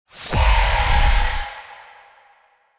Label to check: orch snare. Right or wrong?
Wrong